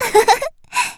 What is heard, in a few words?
laugh; Eternal Return; Tia; Voice Line; KOR